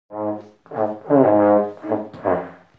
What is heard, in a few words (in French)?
trombe